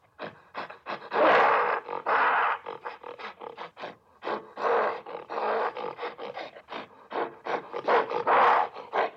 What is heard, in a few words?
gorilla